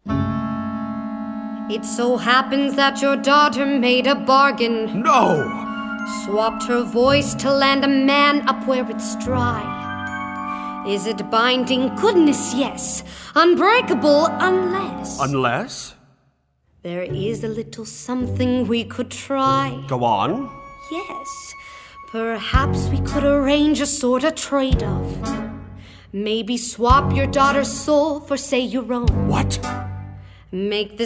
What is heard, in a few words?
vocals